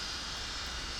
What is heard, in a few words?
Background Noise